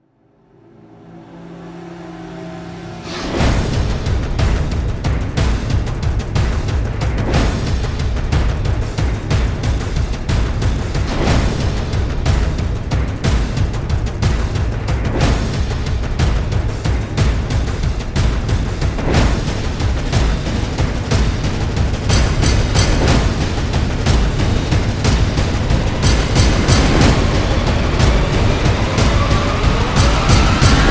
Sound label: Drums